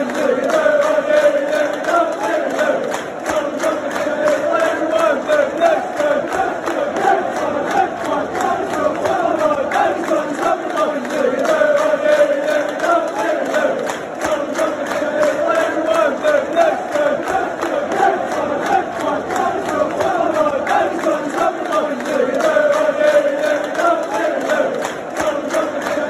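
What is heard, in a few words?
player chant, football fan chant; richarlison; tottenham; united kingdom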